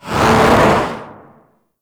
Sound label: Air Bombard Projectile Whoosh; Warframe; Venus